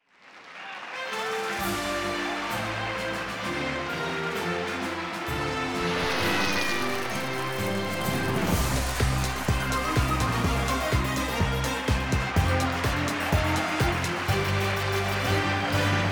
Intro Fanfare; BTV; Buzz! Brain of the UK 2009

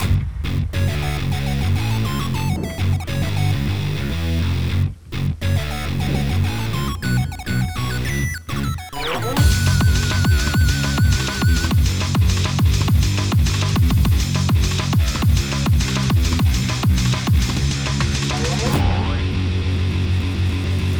Thunderstorm (Instrumental); Hotline 024; windows

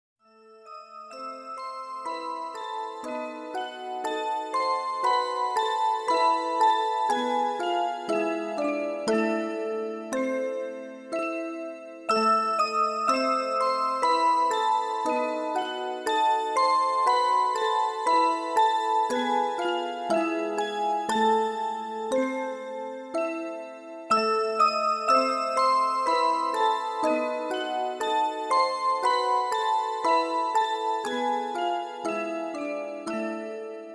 Music Box, Event BGM; Genso Suikoden IV Original Soundtrack

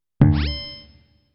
Monitor OFF; dot hack

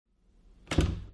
Inner door Close; Granny: Chapter Two; Android; mobile

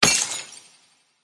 Gain Crystal

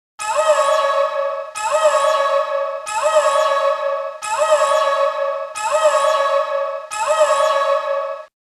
Warning; Darius 30th Anniversary Box

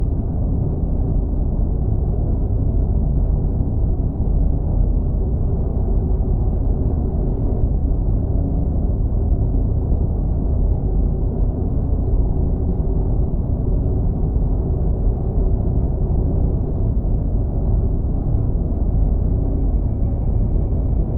Temple ambience; Legend of Grimrock 2012

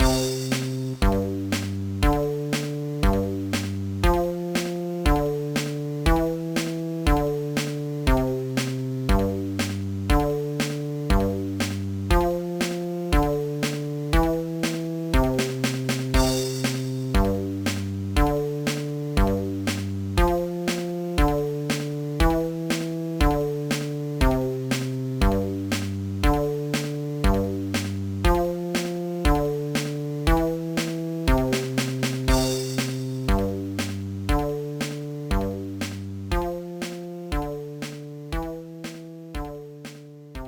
Musical Instrument, Bent Guitar

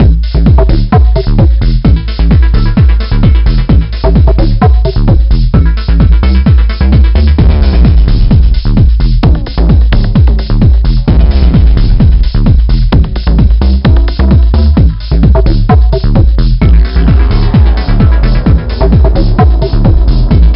Techno Hit; Scrabble Interactive 2009 Edition; nds

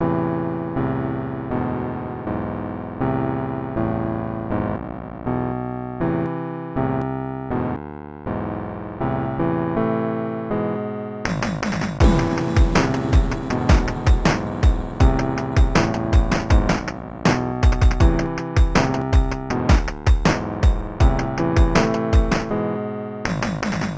Game Over Chiptune Version; Friday Night Funkin' Upside

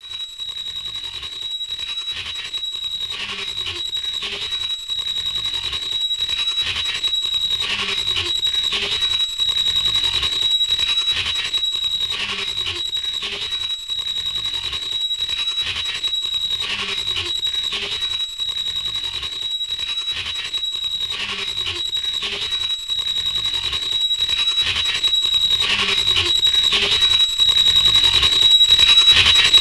Mine Tension

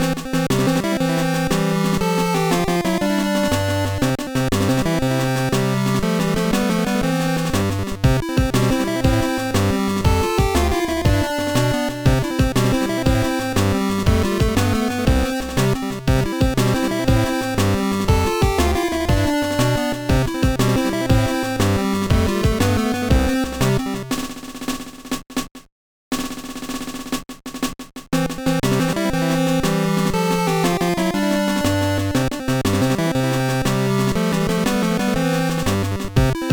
BGM; World Advance Soccer Shouri he no Michi 2001 GBA

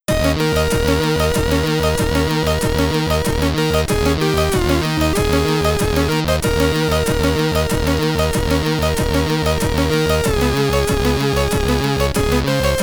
Victory (SID Stereo)

